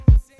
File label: KIK